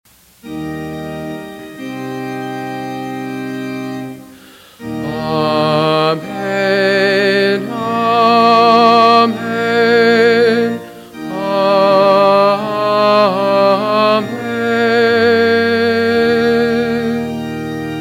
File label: Amen